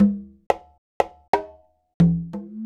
Conga, Latin Percussion

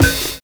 PHAT BELL; Univers Sons - Kitch 70s

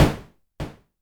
snare, Korg ER-1

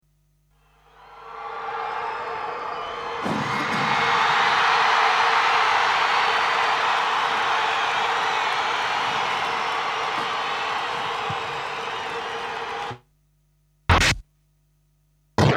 Sound Effects; Kniteforce; 1000 Samples Volume 1

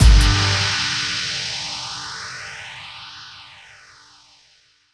DANCE FX

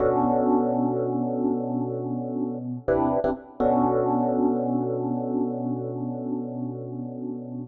El Piano, 125bpm Bm; Zero-G; Nu Disco; Construction Kits; Fashion Week

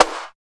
127 HARD SNR; East West - Phat & Phunky CD2; 90's Sample CDs

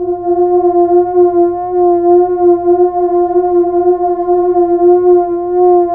Polymoog; OLD SYNTHS; Rubber Chicken Software Sample Bank

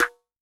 JNGL SN, Snare; Yamaha DTXpress-II

